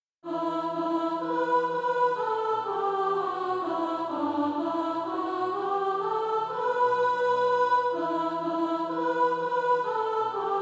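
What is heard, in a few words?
soprano, SATB Practise Music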